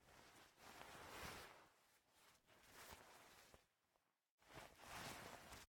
Player Paper Ready; MiSide

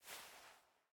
Item Hand Move; MiSide

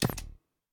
TV Off; MiSide

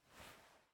Chalk Talk; raw game audio files; Mita; MiSide

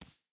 Hand Step; Game rip; MiSide

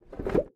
Check Furniture; Game rip; MiSide

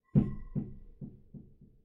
Wardrobe Knock; MiSide

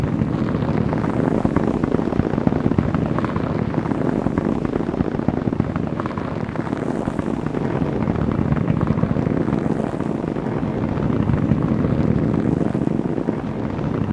Corridor Rubber; Game rip; MiSide

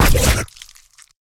Enemy Death; Game rip; MiSide

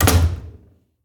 Door Shut; Game rip; MiSide

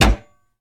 Shut Table; game audio; Mita; MiSide

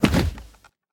Maneken Kick Sit; Game rip; MiSide

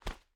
Barefoot; MiSide

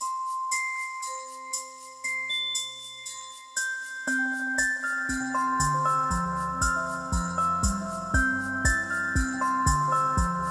Music Menu; MiSide

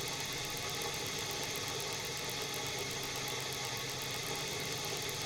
Switch Move; Game rip; MiSide

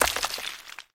Figure Drop; Game rip; MiSide

